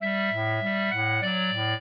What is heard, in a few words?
clarinet; Mozart